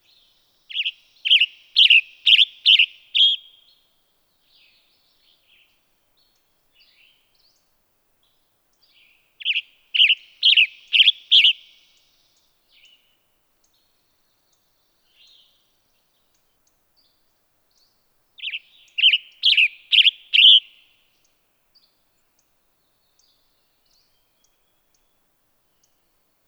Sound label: Western Tanager Sounds; All About Birds Cornell Lab of Ornithology